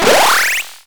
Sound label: Retro Video Game Bonus